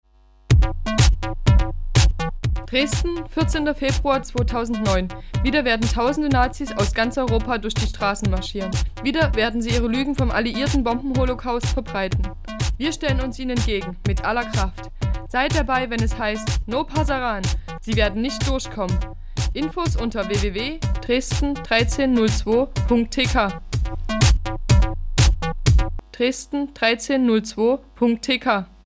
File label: jingle